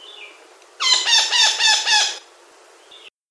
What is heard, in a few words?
Echo Parakeet, Mauritius; Mauritius2017